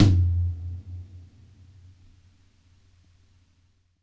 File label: low mid tom drum one shot f key; Duration 0:06; tom drum samples